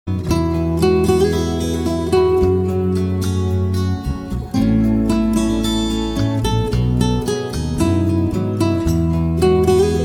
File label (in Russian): relaks melodija na budilnik